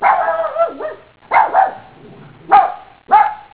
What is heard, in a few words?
terrier, barking dog